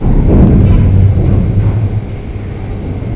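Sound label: platform move; svencoop; crystal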